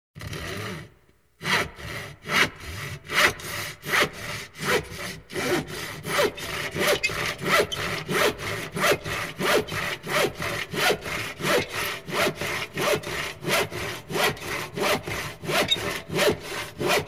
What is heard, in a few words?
Sawing wood; SOUND EFFECTS1